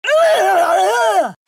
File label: Death Sound